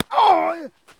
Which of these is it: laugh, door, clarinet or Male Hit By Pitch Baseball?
Male Hit By Pitch Baseball